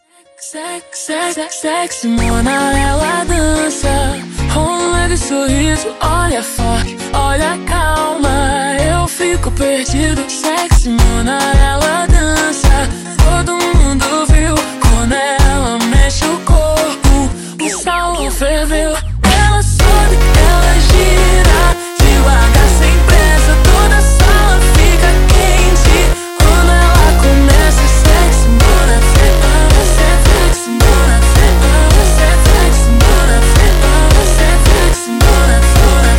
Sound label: Funk ultra Slowed